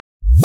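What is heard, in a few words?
drum kick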